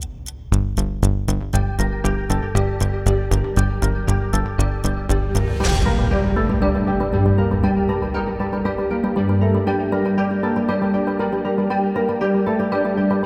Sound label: tension; by burak sound bank; tension gerilim vol 46